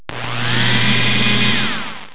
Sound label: whizz